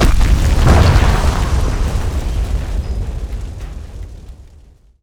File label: rain of chaos cast; warlock